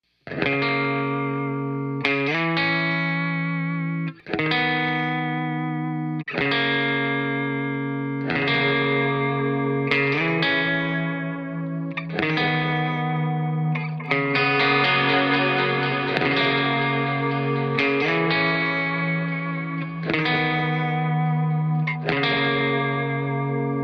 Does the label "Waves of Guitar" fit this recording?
Yes